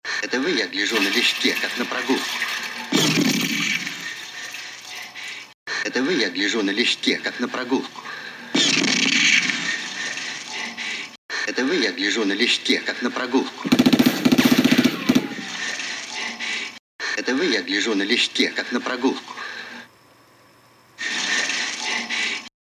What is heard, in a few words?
gunfire; Stalker